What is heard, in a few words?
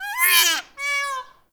Forest Bird Cries; Warframe; Grineer Forest